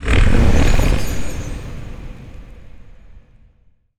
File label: necroid moving out construction; Stellaris; necroids